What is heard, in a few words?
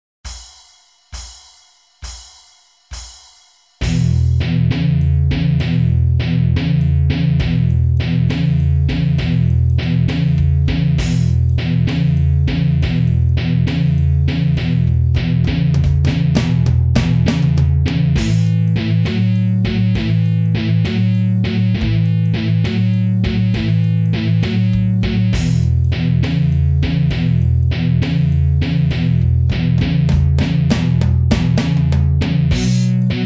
Blues Shuffle Drums Guitar